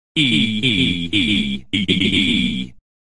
EEEE; cave

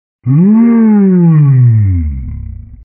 woo

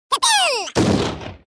kaboom

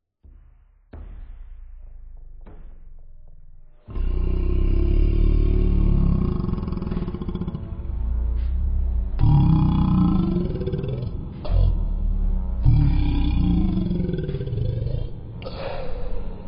bark; vapesquared

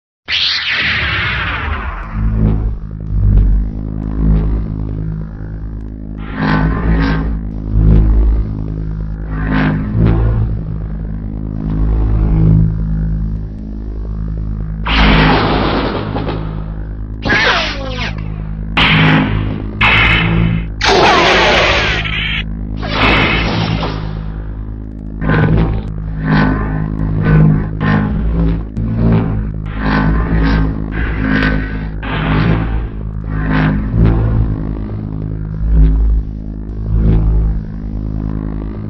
lightsaber

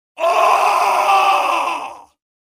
yell; cave

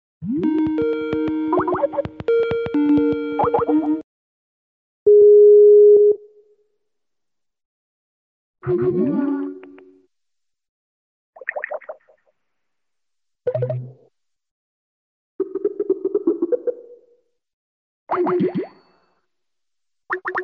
skype; cave

